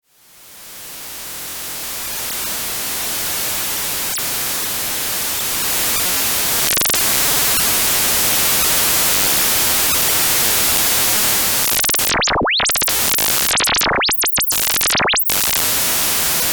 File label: sine wave experiment